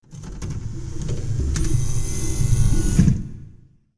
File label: Alien Ship Hatch